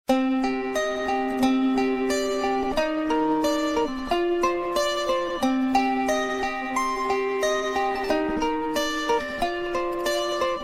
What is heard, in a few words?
samsung galaxy tone ringtone